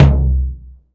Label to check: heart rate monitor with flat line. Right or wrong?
Wrong